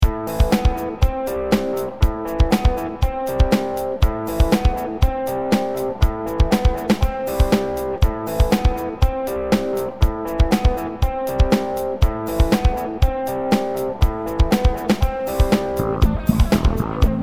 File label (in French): musique